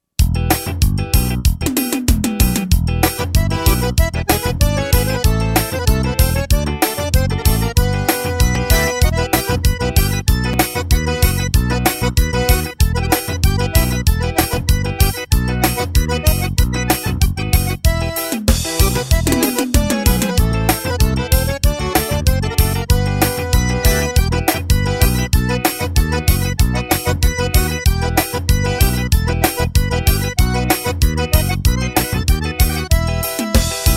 ritmos roland g70 xote zabumba

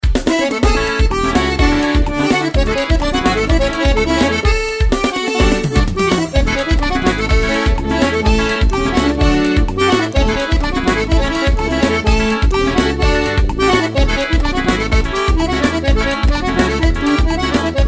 forro

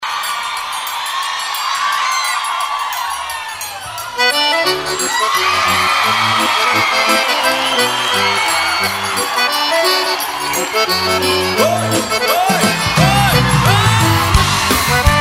xote

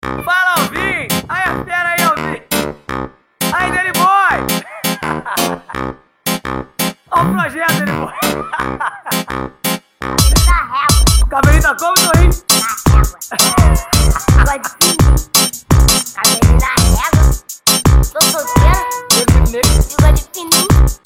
brega funk batidão transa reggae

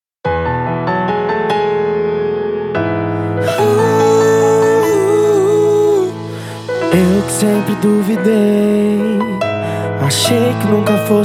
pagode

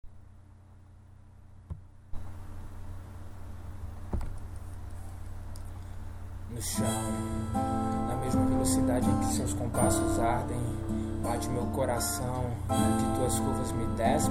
violoncelo

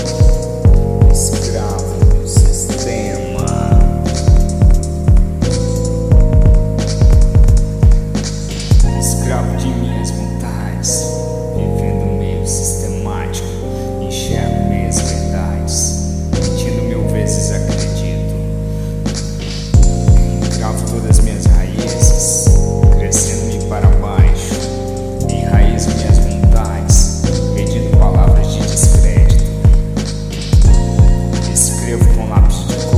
saxofonista escravo do sistema

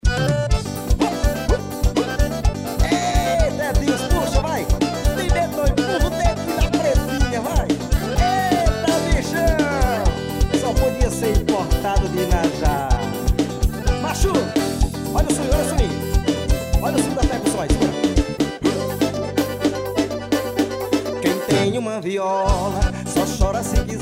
seis cordas

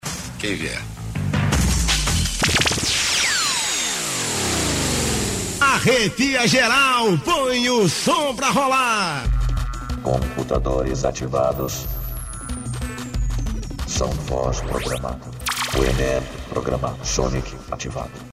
abertura

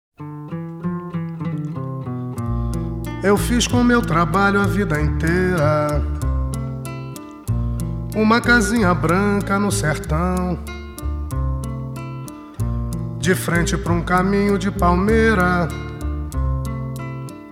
toada brasileira

